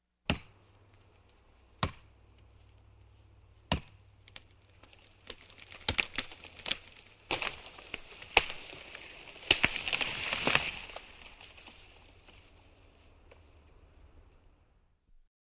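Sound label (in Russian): рубят небольшое дерево падает; топор; В лесу звук топора по дереву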